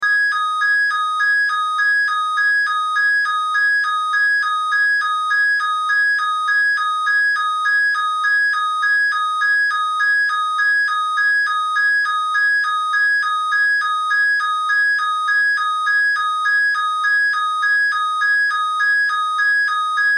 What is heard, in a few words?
Alarm Two Tone, Alarm Two Tone Loop; Electronics; Technology; Alarms; Blastwave